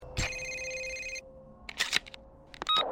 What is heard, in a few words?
Landline Ring Pick